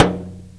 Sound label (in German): Grammophon, Household; Convolution; NI Kontakt 5